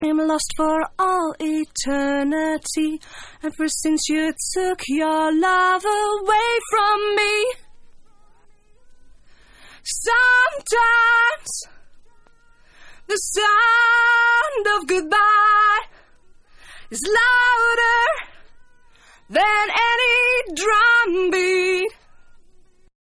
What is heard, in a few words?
Acapella